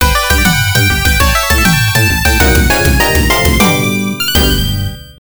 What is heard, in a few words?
Round Clear (Arrange); The Fairyland Story x68000